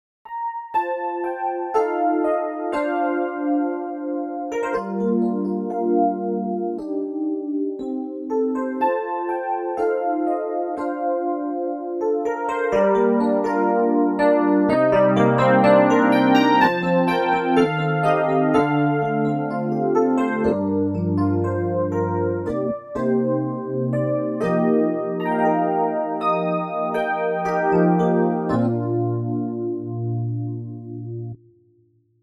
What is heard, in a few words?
love song